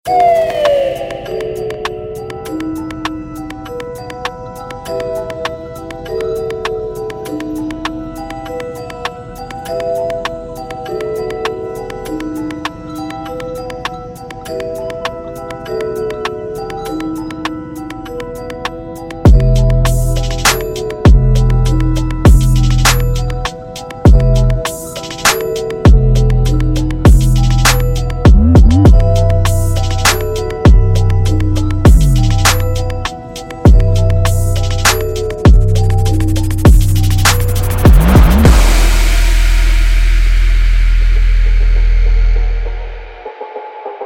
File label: Trap and 808s; Cymatics; Trap and 808s Premium Sample Library